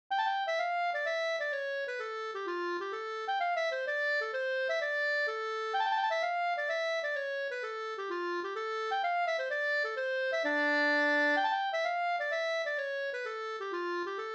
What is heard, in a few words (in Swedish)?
polska springlek